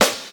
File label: studio snare one shot g key, Royality free acoustic snare tuned to the G note.; snare drum samples